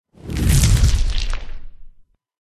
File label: black widow buff in